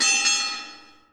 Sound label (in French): chute; Feed The Beast